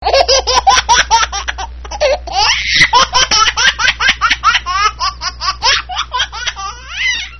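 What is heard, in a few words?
contagious child laughter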